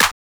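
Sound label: clap; E-Lab; X Static Goldmine 1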